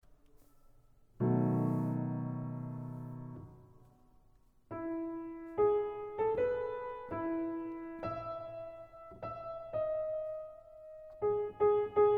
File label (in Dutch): Instrumentaal; Landerkennung